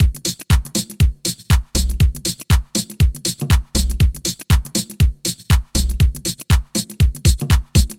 Latin House Loop

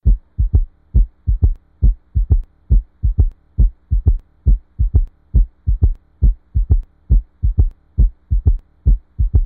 Heartbeat Sound

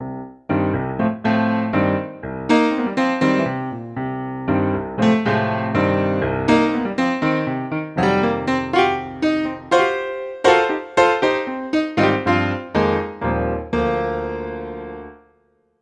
Gospel Piano Loop

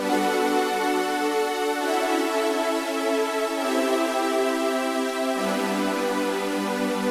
Melodic Trance

